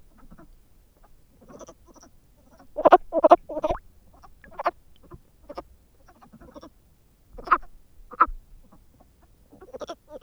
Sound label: frog underwater